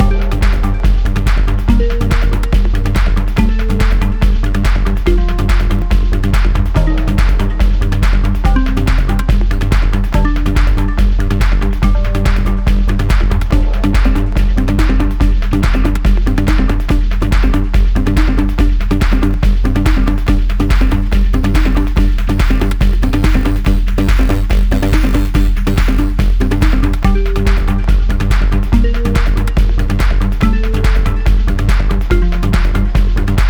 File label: Panic Loop; pang adventures